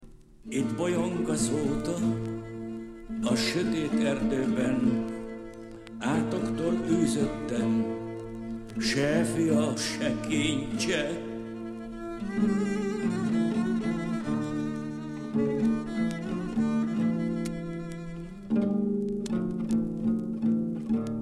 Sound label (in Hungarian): ballada; ballada a rossz asszonyrol